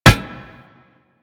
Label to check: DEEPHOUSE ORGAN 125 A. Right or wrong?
Wrong